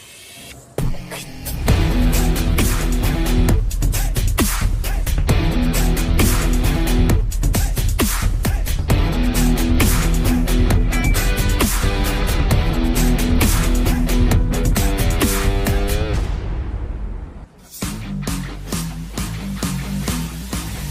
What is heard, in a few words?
Train Fight BGM